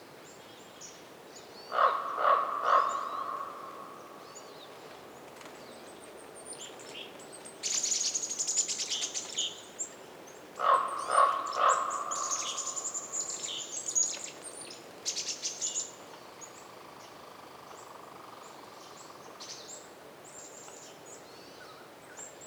WINTER Forest day raven calls reverberating and other birds chirps with some wind, North Laurentian Woods, Canada